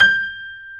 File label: G5 piano; AKAI S-Series CD-ROM Sound Library VOL-8